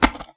Drop Thunk

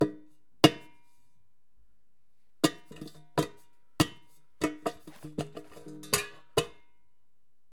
Clattering Metal Objects

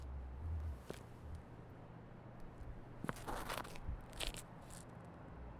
Step Slide Concrete

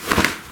Grab

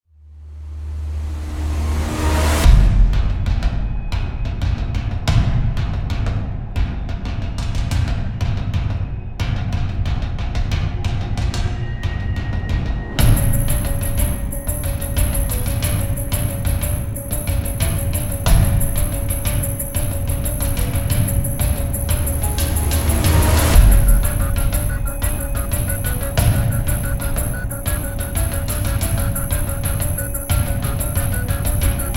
Action Movie Trailer

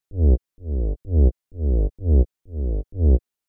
Bass 128 bpm

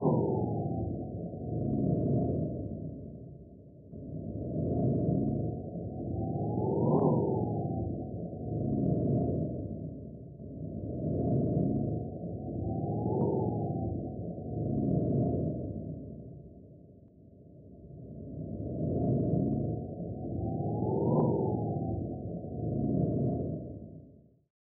Wind Snowstorm

